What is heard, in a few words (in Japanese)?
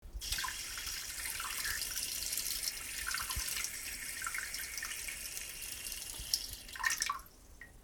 しじょーばちゃちゃすぱっと終わるおしっこ